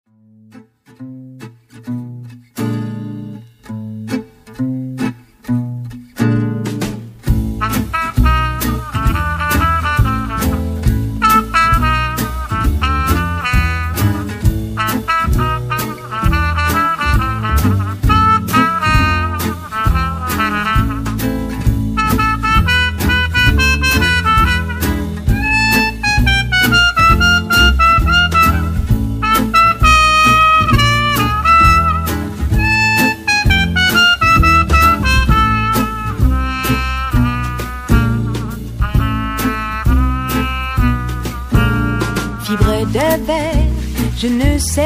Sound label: French Cafe